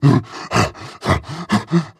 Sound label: breath long, zombie; creatures